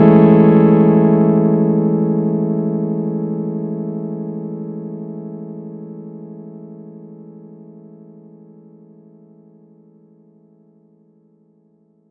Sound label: Chord; ModelCycles